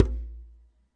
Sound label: arabic perc, Arabic Percussion Kit; ETHNIC and WORLD PERCUSSION; Arabic Percussion Kit 3